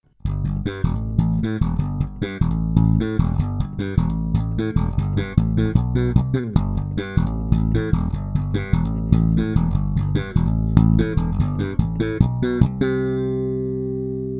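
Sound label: Copper-L200, bass; La bella; Rotosound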